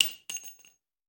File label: Bullet Shell; Sound Ideas; Guns